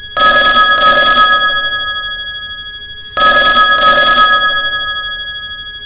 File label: Looped bell